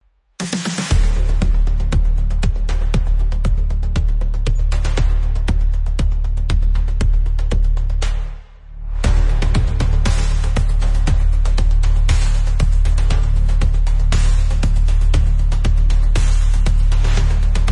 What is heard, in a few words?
Dark fight action thriller soundtrack ringtone